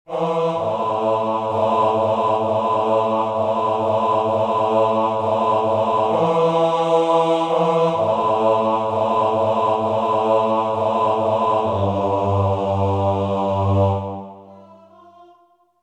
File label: Bass